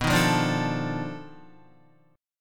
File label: B 7th Sharp 9th Flat 5th, down, Guitar; B7#9b5 strummed; JGuitar